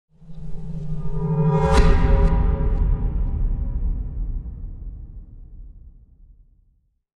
Morphing Bell, Abstract processed bell sound with bang.; Sound Design; Warped